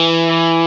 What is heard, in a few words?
GTR Tube, Dirty; Club-50 - Foundations Roland